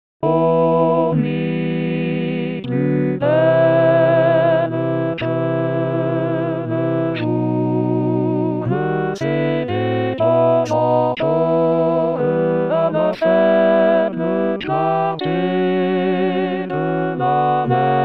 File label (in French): alto